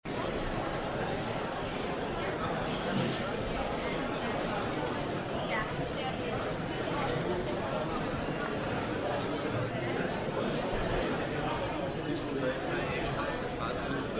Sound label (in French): ambiance compactants